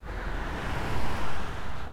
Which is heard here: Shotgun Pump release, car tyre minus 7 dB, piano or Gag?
car tyre minus 7 dB